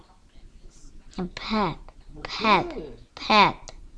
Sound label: pad